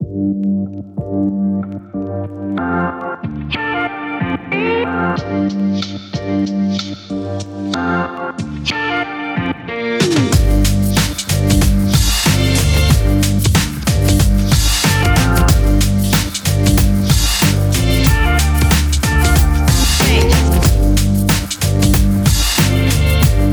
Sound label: Hip Hop Energy